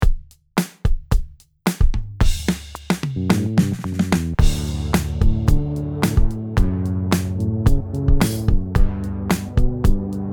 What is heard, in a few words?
starter snare 110